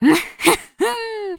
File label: hehehe; kujoukaren